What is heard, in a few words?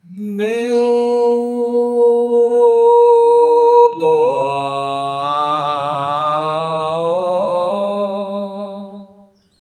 powerful and emotional, devotional